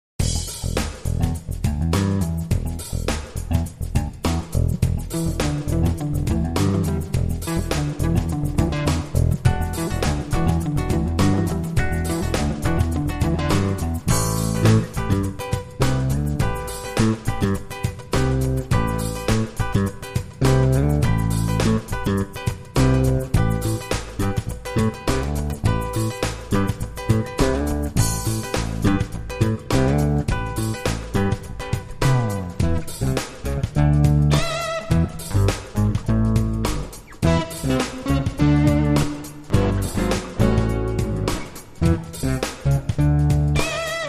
Bluesy Groov

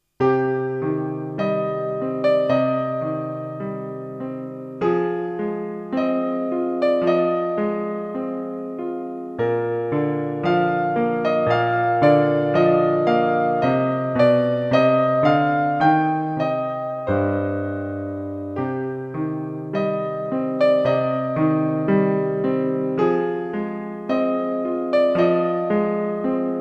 Piano Solo

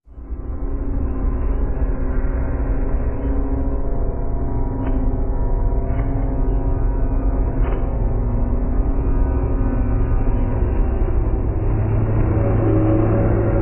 The Emergence Ambience

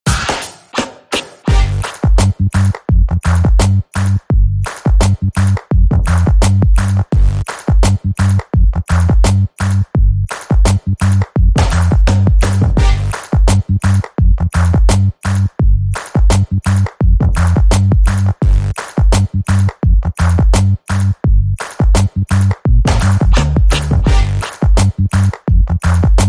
Ping Pong

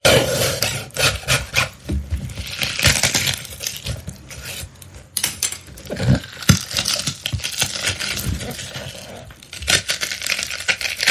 Ripping Saw

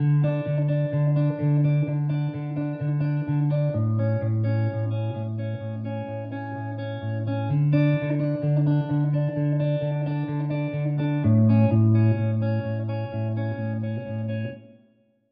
Gentle Along The Way, No Drums

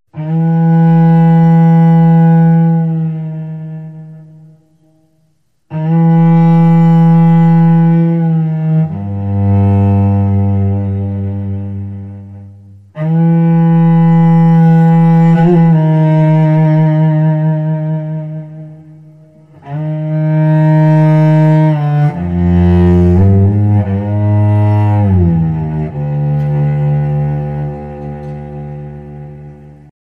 Cello Whale Song

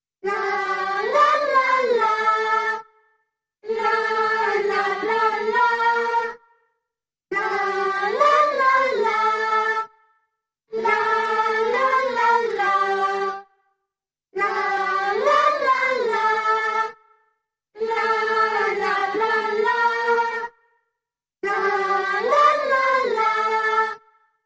Creepy Circle, Choir Only

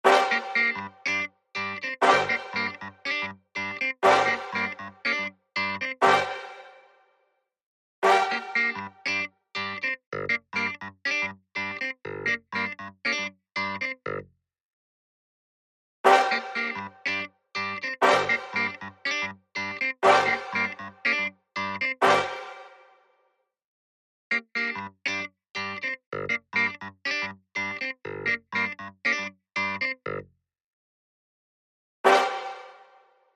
Keys & Brass Only

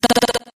Vox Vibrator

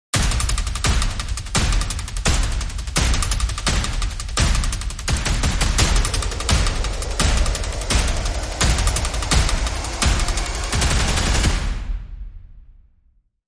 Dramatic Sting Seven Perc

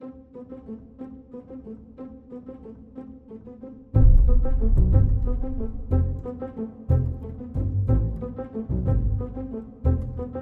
No Drums No FX

